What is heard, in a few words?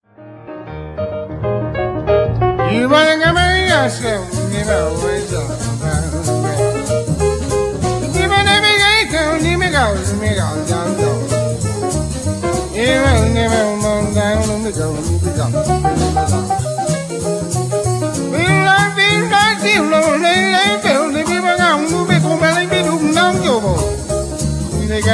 swing